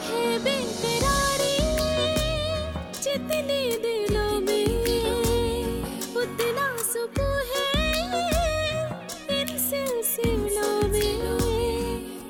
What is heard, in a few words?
new hindi music ringtone